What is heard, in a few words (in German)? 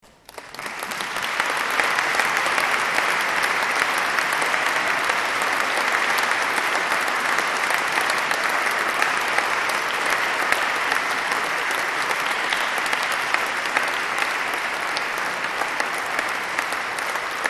APPLAUS; Vokalensemble; Hymn